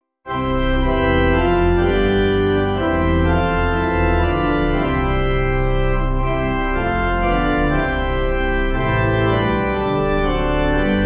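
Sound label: Pipe Organ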